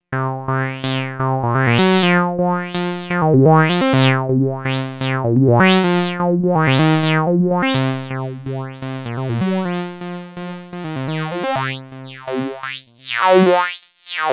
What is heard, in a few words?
Filtertype reso